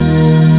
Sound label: sweet key